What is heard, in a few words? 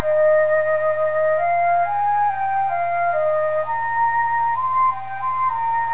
flute